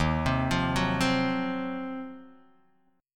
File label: Eb Minor 6th Add 9th Eb down Guitar Open D